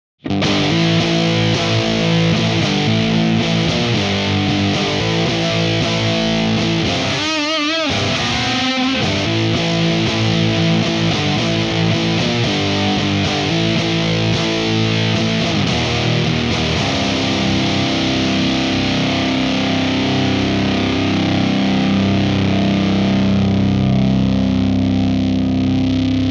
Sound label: GTR GAIN 75 LO BLAST 100 HI BLAST 60